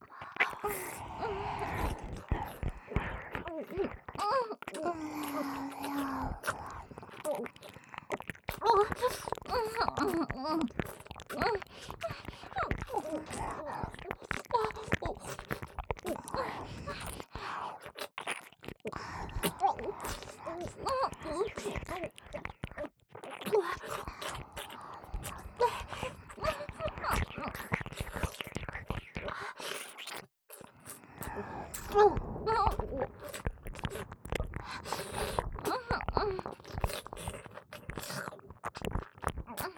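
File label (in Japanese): イラマチオ＋片耳舐め; 美冬; 小春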